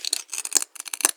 glass strain; physics

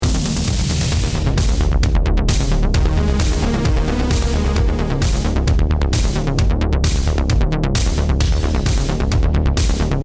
bomb ten sec count; hotlinemiami

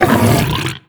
grunt unhappy, barnacle creatures; Half Life Alyx

